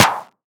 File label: clap one shot d sharp key, Hand Clap D#; percussion; single hits one shots